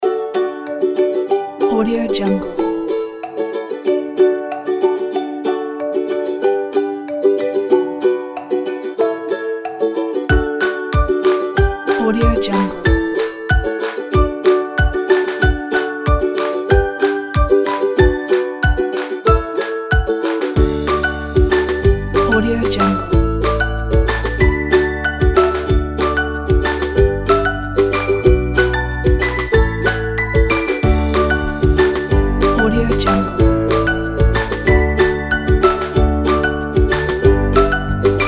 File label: children kit